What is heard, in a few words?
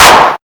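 clap; E-Lab; X Static Goldmine 1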